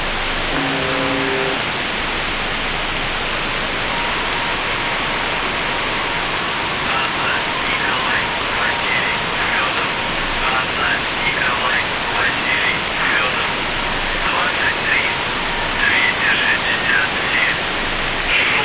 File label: buzzer usb msg